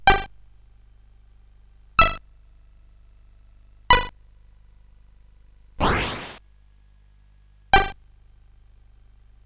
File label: Breakout Remake, sid CSG8580R5